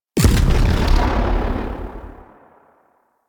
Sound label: Fan Shrapnel Projectile Burst; Warframe; Warframes; Protea